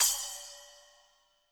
splash, splashes

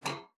PLOK G5, spinet; Xsample VOL-7

